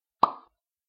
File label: pick